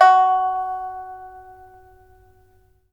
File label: PIPA F#5; Spectrasonics; Heart of Asia